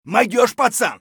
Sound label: scav cheer; eft